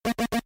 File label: game synth beep